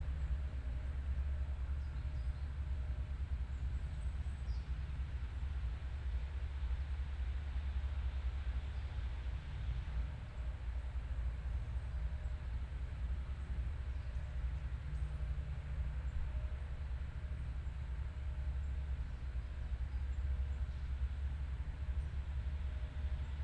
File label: yamanakako sound